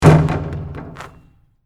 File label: Basketball Rim, Sports, Bounce; Net, Swish; BlastWave